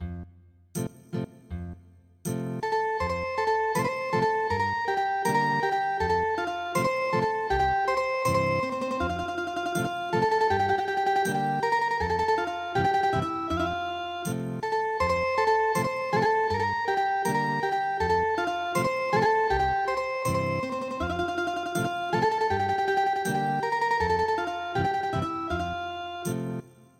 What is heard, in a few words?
Ethnic Guitar, traditional